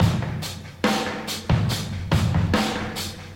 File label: Rock, Drum Loops